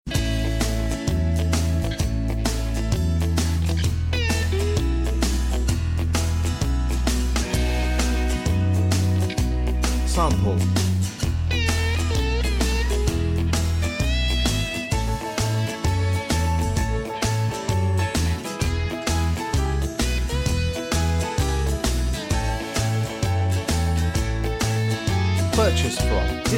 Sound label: beat